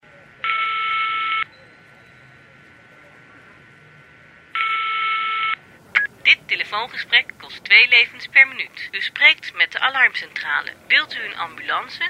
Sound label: dutch new style, ringtone